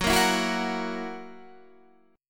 Gb 7th Gb down Guitar Standard, chord; strummed; JGuitar

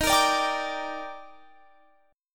Eb Major 7th, Guitar, Open F; EbM7; strummed

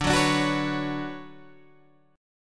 D Major 13th D down Guitar Standard; strummed; capo 9

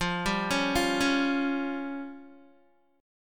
F Suspended 2nd Sharp 5th, Guitar; Fsus2#5 arpeggiated

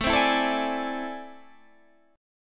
A Minor Major 11th, down

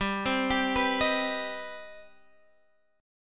C Minor Major 7th G

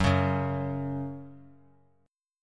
Gb 5th Gb down; Gb5 strummed; JGuitar

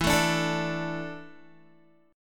E 7th Suspended 4th, Guitar; E7sus4; strummed

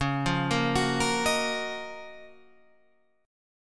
F sharp Major 13th C sharp

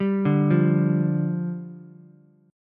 D Minor Double Flat 5th, down, Ukulele; Dmbb5; arpeggiated; JGuitar

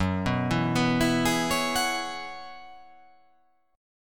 F sharp 9th Suspended 4th F sharp, chord

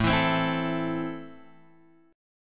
A Minor, Guitar, Open F; Am strummed; x,4,4,7,7,4

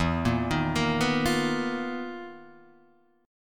B Major 7th Flat 5th E, chord shape; BM7b5/E; arpeggiated; JGuitar

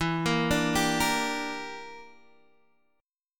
E Minor 7th Flat 5th, Guitar, DADGAD; chord shape

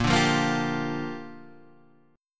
Bb 6th Bb; strummed; 6,5,3,3,6,3

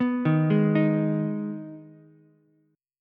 G sharp Minor G sharp down Ukulele, chord; G#m arpeggiated; JGuitar

